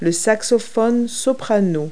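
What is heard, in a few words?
soprano saxophone; Multi-Lingual Talking Picture Dictionary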